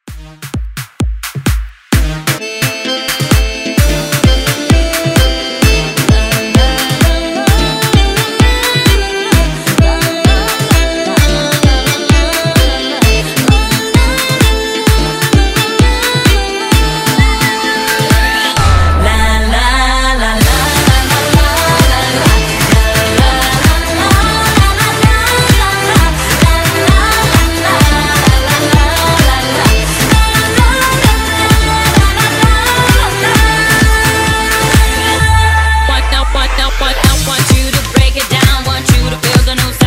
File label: House Redrum